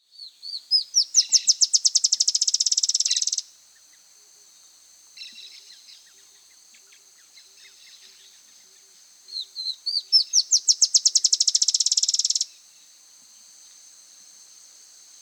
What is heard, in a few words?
Chestnut-shouldered Antwren (Terenura humeralis), Peru; S. America